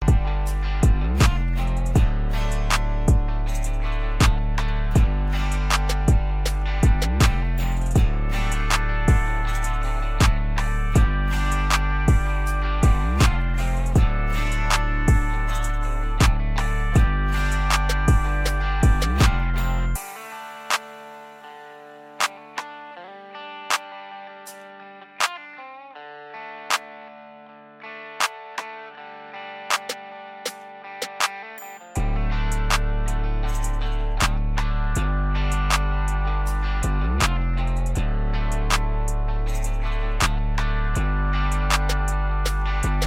trap, Trap beat in country

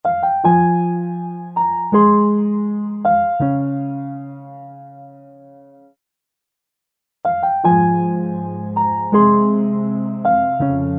piano loop; waves

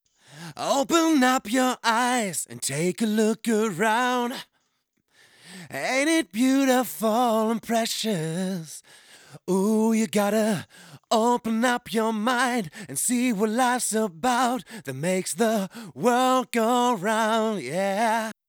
Male Vocal; asp8024; COMP IRON

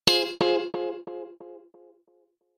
Tape Delay

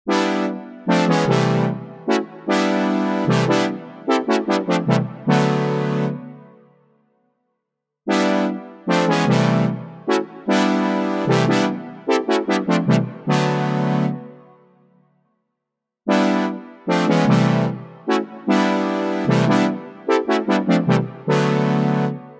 MS fuller stereo

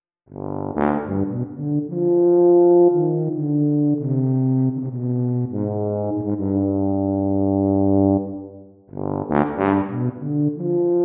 tuba; dexibell

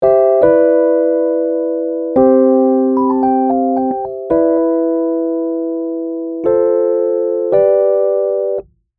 rhodes dry; waves

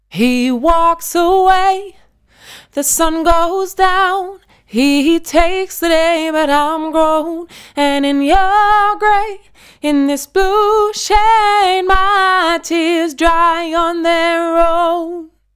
retro sta level vocals

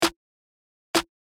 snare after; waves

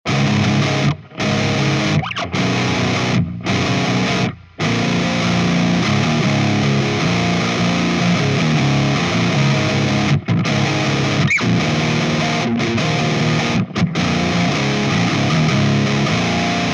metal cab

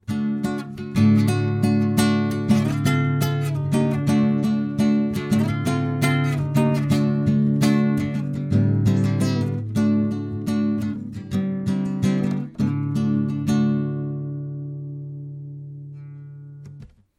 Riff

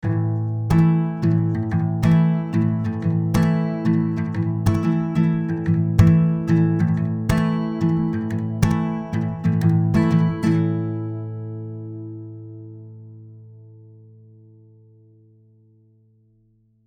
guitar slapback; waves J37

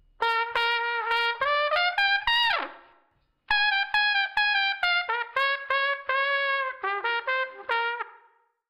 trumpet

